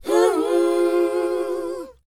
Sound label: WHOA D#, Vocal R&B & Dance; ILIO; Vocal Planet